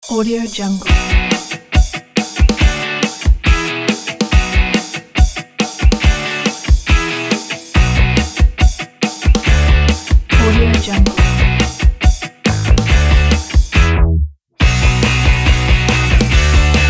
summer indie rock party

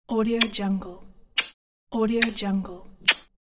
kissing

